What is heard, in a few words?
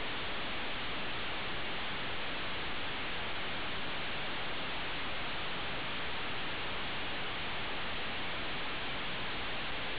silence